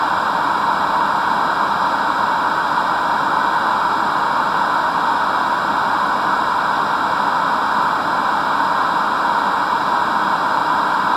Yet More Static From Another Old Cheap Portable Analogue Television